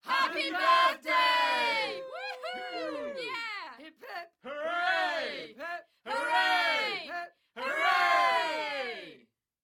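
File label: Crowd Loop Happy Birthday, Hip Hip Hooray; 344 Audio; Screaming Vol 2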